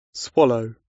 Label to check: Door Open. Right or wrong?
Wrong